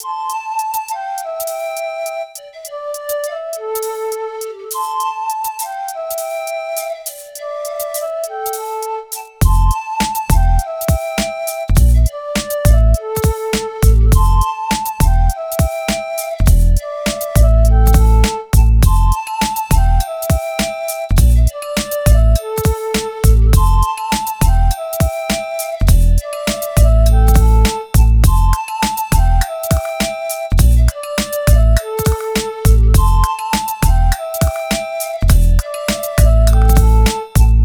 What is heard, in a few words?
102 Fmin, Hip Hop Exotica